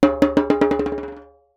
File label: Jembe Bounce; Percussion Samples